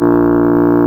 REED A#; Big Fish Audio; Synclav FM

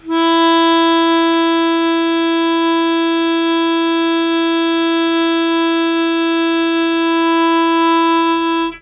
Long Sustain Aa E1, Harmonica; Zero G - Harmonica

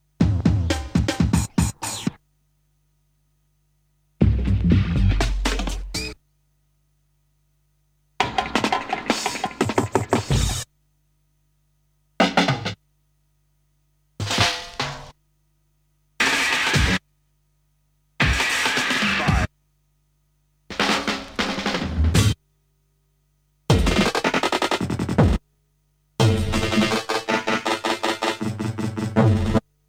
Drum Rolls; Kniteforce; 1000 Samples Volume 1

